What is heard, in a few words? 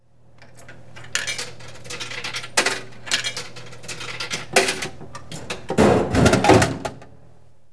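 soft drink; citylife; business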